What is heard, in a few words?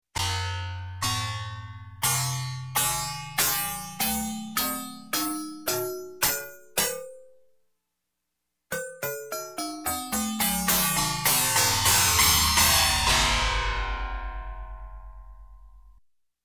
Space Space Chime, Up And Down Scale; Electronic; Sound Ideas 2000 Series